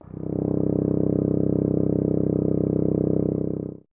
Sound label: BRS TUBA, Solo Instruments